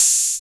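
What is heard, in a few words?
HH, TR-909 hi-hat; 90 s Sample CDs; Sound & Vision - Gigapack I CD 1 (Roland); TR-808 & 909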